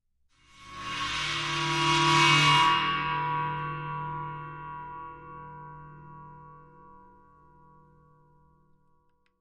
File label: China Cymbal Bow, cymbal & gong; China 16 Cymbol Bowed 3